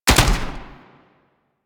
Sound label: SMG Alt Shot; Weapons; Warframe; Tenno; Sundial